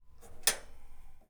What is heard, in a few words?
stove clicking